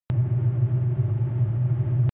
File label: drone hollow wind